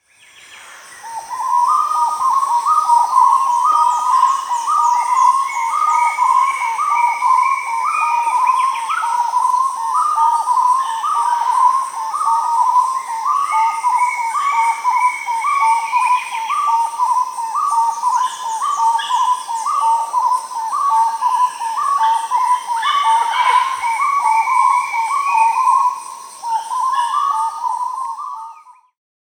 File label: Starred Wood-Quail (Odontophorus stellatus), Peru; Birds of the Amazon; S. America